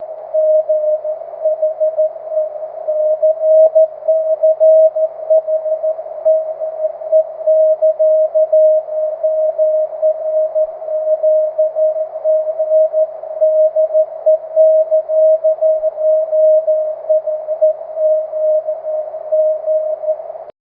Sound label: cw; 23cm